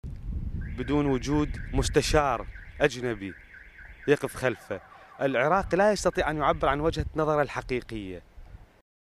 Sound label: loop